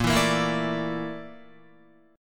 Bb Major 7th Flat 5th Bb down Guitar; BbM7b5 strummed; JGuitar

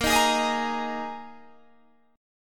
Bb 7th Suspended 4th Bb Guitar Standard; strummed; JGuitar

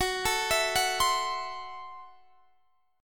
F sharp Minor 7th Flat 5th F sharp; arpeggiated; x,21,19,21,19,20

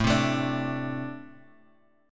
A Minor Double Flat 5th A, Guitar, Open G

